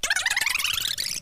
tape rewind

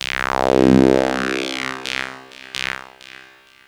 sequence, synths; Notes

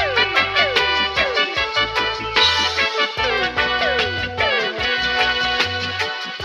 horns, Loops

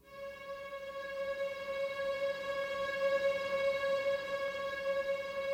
String

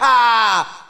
"ah"

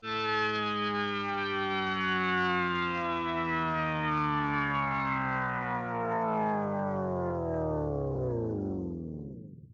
plane land

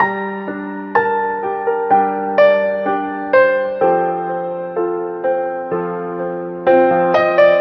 piano, Loops Sad

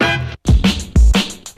full set, Drum loops Kick Snare

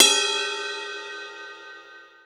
cycle, Cymbals

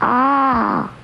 "ah" girl realize; Voice; ooh ahh

